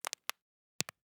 Crepitus, flex, pop, snap, crack, multiple, hands, light to deep, gritty; Eiravaein Works